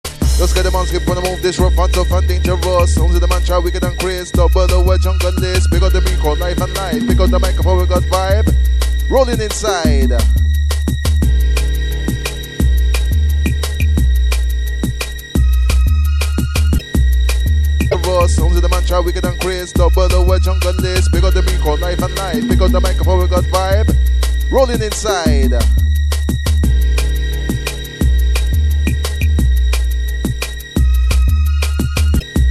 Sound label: Liquid Soul Sessionz, Drum and Bass Jungle and Old Skool; UK Bass Radio